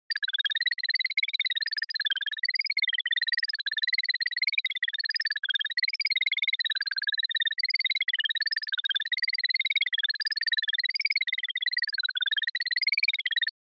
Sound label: Panic Fast Long; Onoma